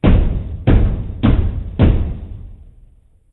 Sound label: door knock